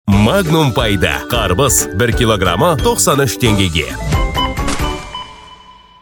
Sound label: music